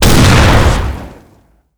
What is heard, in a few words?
shotgun fire heavy; resolve